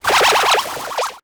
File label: Multimedia Internet CD-Rom Flash Animation Cartoon